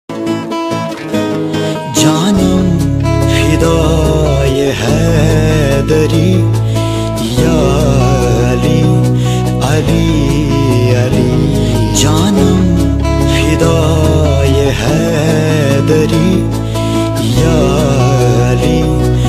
islamic ringtone naat ringtone qawwali ringtone arabic ringtone